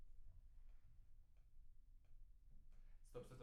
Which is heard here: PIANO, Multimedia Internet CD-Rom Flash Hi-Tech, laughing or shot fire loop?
PIANO